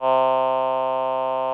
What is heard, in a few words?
VP-330, String Machines